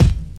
smooth steel kick drum g sharp key, Royality free bass drum one shot tuned to the G# note